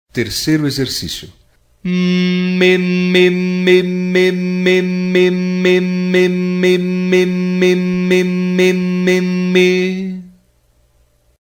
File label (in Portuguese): Aquecimento projecao da voz, ressonancia